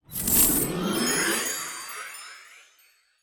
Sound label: Purchase Made; UI; IAP